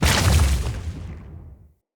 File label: Wrath Head Spike Burst; Bromius; Abilities